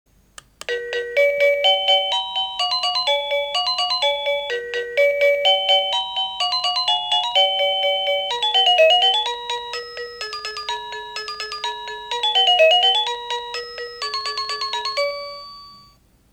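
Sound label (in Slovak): Bezdrôtový zvonček melódie ukážka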